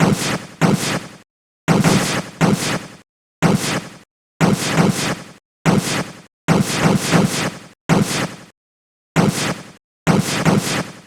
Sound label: heartbomb